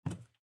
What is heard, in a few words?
run wood